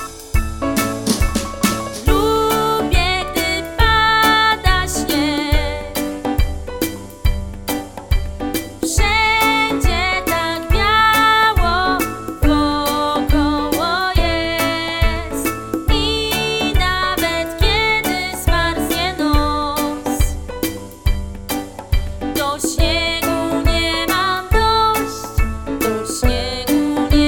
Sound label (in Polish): WOKAL